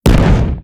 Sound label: damage hits explosions